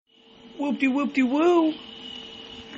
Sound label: Whoop